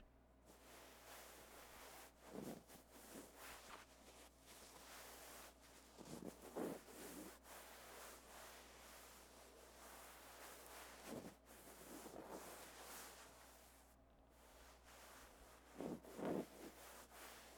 Burlap Cloth Rub Scratch

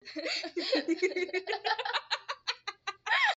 Laughing

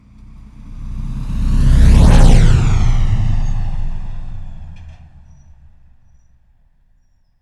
Huge Truck Drive By

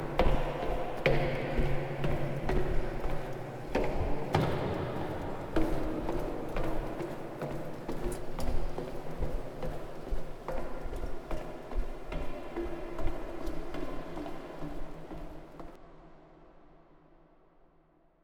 Going Down A Metal Staircase

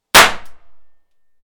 Flobert

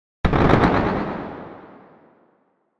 32 Shot

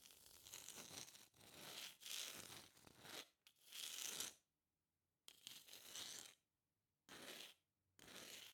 Creature Scratching Tile Floor

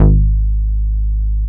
Bass

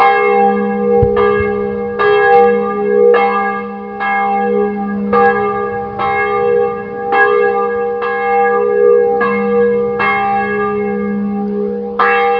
Church Bell

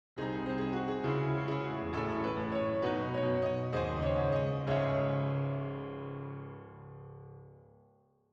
Quick Piano End

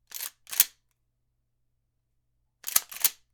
Shotgun Pump

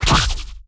Energy Short Sword

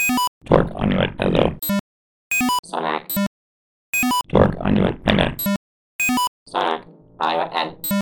Alien Radio Chatter

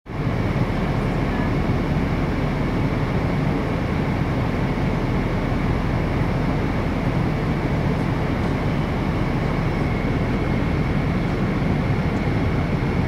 Boeing 777 Interior Jet Engines Inside

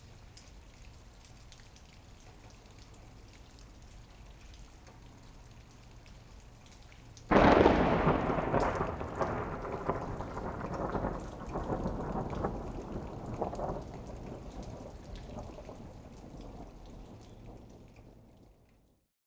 Lightning Thunder Crack

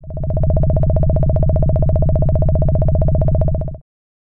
Woodpecker Pecking

